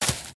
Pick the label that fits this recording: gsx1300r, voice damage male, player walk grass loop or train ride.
player walk grass loop